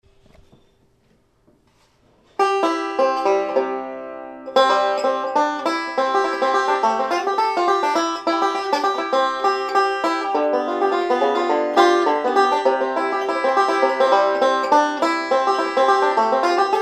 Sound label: banjo c